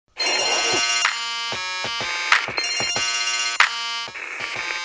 Phone; Gangster

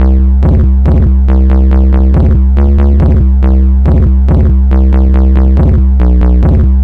Bassline Sample

